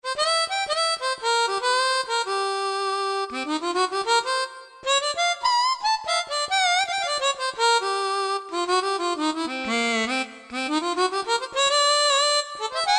Harmonica, Korg T3

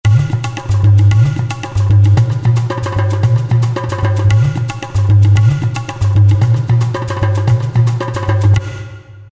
2Bar Loop; World; Roland SR-JV80-05

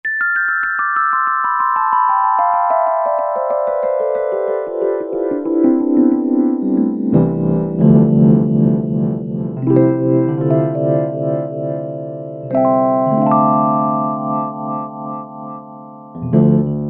Suitcase 88, Roland SR-JV80-08; '60s & '70s

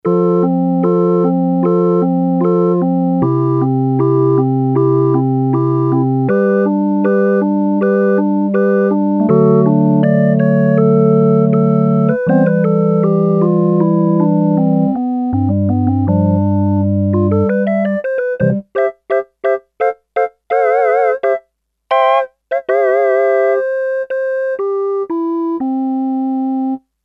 Organ Flutes, Sequential Circuits Six-Trak; Factory Programs

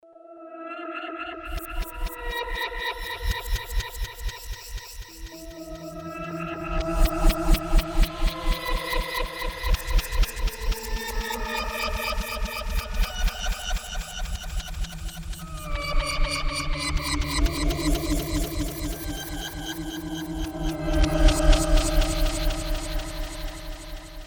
Roland JV-880 REVERSE MAD; Factory Patches

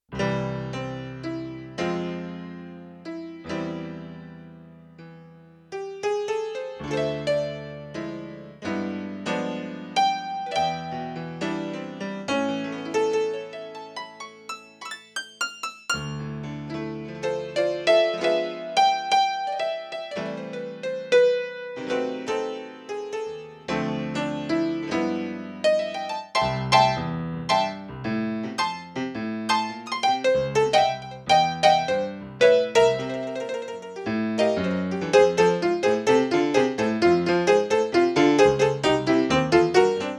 61 key Rock Piano, Yamaha TX16W; General Performance

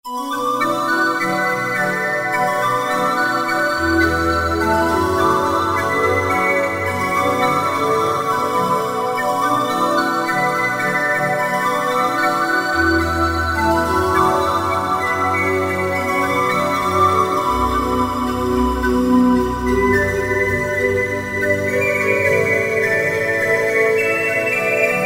Pad Mystery Pad, Yamaha EX5R; Factory presets

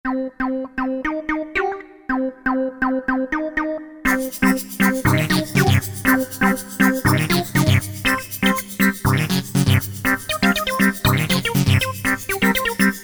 Analogue Sp; Alesis Rap Techno Dance

